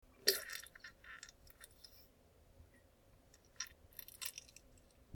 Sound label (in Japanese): ぶぅ ぅぅぅ, 下痢の音